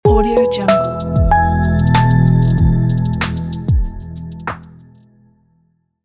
marketing logo

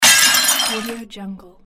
plate breaking

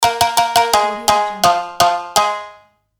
shamisen intro

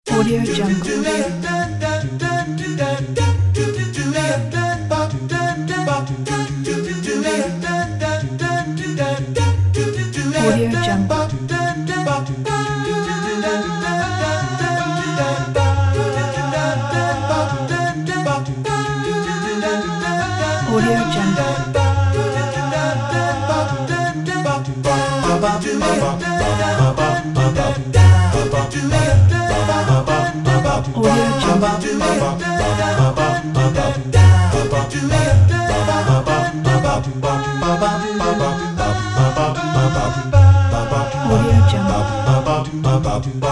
happy playing kids acapella

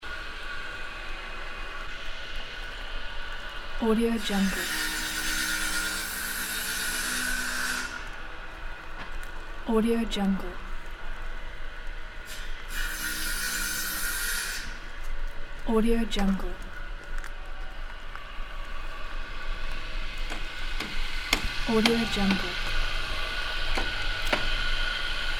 carpenters workshop ambience; fxprosound

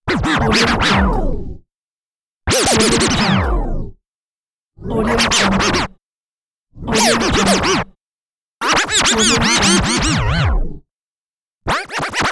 vinyl scratches

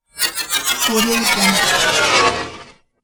transformation

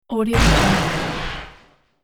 robot space impact futuristic fx

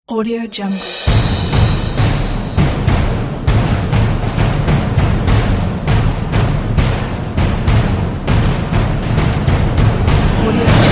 the drums

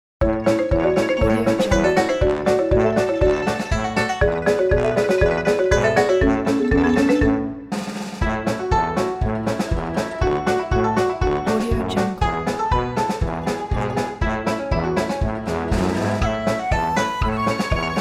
happy chinese year

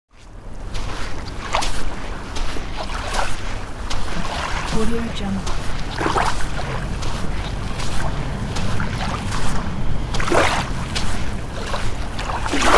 walk on the sandy beach

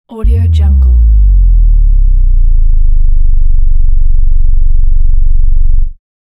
sub bass attraction

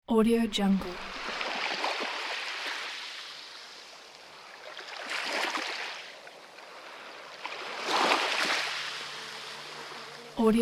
river shore

